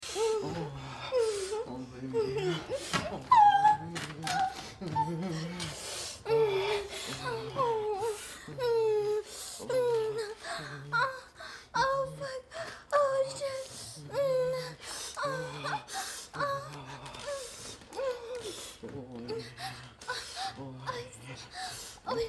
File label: moan